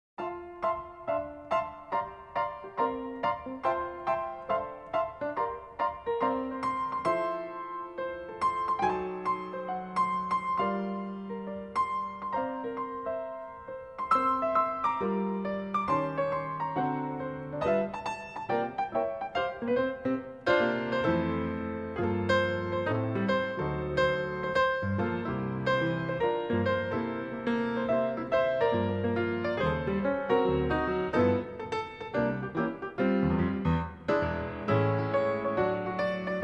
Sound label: A Piano Christmas